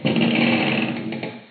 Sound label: creak